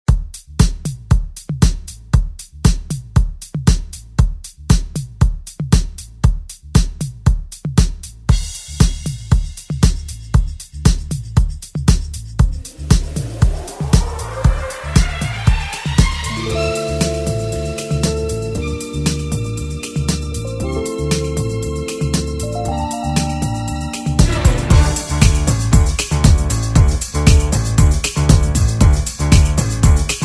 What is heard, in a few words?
90s; ReDrum